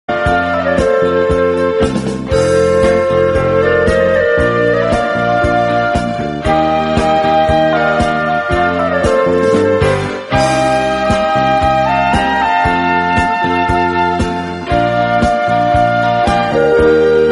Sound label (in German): Orchester